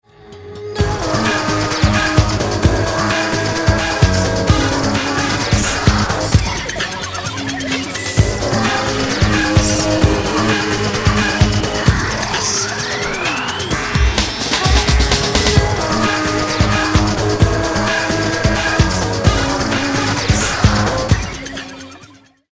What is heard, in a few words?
distort